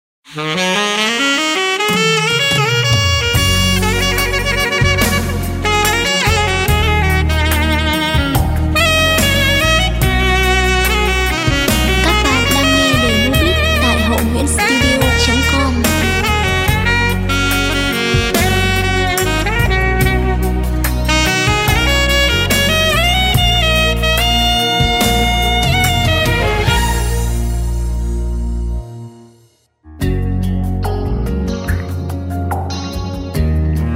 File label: beat, playback